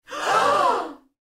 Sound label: crowd whoa